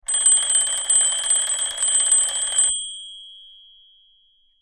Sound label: alarm